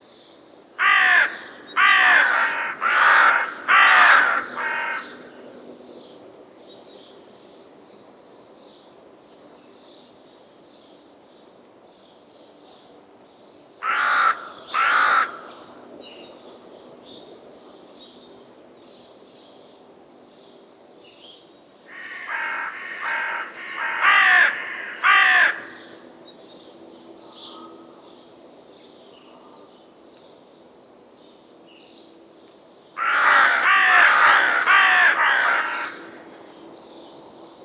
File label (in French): corneille noire